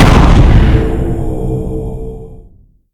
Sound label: God Shockwave; Huitzil